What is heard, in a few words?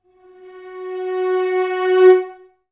VA CRSC F#3, Advanced Orchestra; Best Service